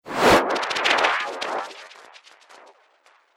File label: Wizard Frazzle Spell, magic sound effects